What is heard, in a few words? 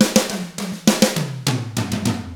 ROCK, Drums; ILIO; Double Platinum Drums 1